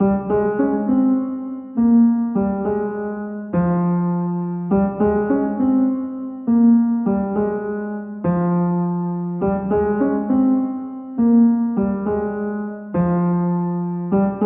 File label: West Coast Melody Loop - 102 BPM C Min Piano; Melodies; Loop Stems; Cymatics; Oracle Sample Pack